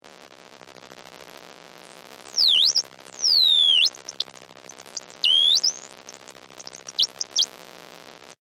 Transmission Burst, Scifi, Transmission, Burst, Science, Fiction; Tune, Scan; Communication; BlastWave